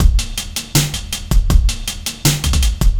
Fat Beat, Drum Loops

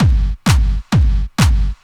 drum 130 techno; Techno Trance Essentials

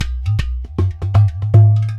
DRM

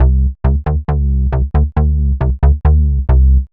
Bass 136 C, uk garage; Stat Bass; 136bpm Lines n Loops

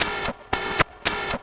CYBER ALARM; Distored Reality

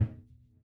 METAL; ILIO - Ethno Techno

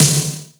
80s Drum Snare; Yamaha PSR E305

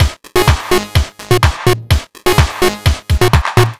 126 bpm full, combi loops, nu dance electro; Zero-G; Soundclash; Dubnotronic

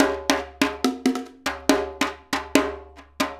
Bongo With Stick; Percussion; Drums; Bongos; Sonic Foundry (Sony Creative Software); Essential Percussion

